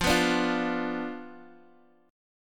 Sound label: B Diminished F, guitar